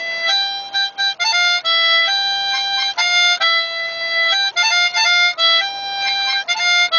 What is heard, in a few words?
Harmonica